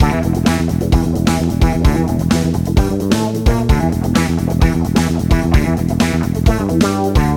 Blade Power Disco Loop; Blitz; Fruit Ninja; Android iOS mobile gamerip 2010

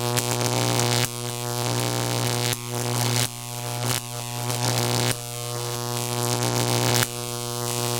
Spark loop

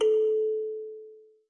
Kalimba

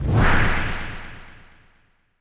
Pig jump

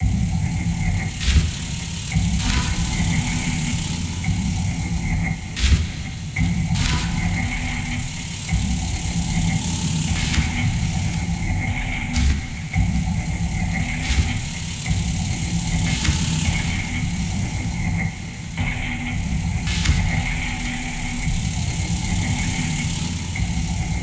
amb power On; PS Vita

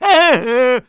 clown taunt; Ringling Bros. and Barnum & Bailey; Wii

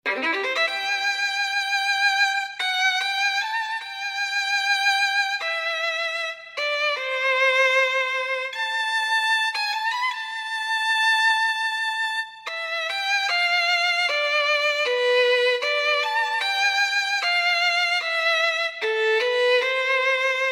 Strings; Mugen Kairou

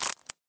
Cat launch aim

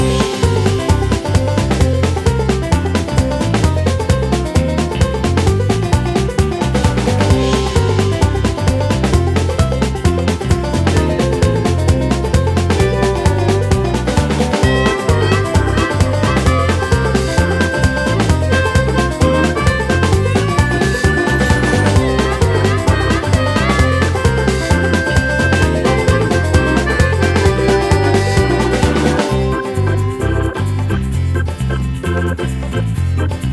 Country Theme Loop; Meow Motors

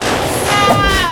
Boss Attack

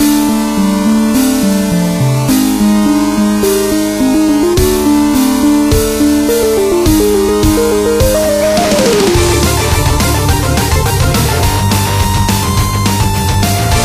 Mostly Dark Universe; jets n guns gold

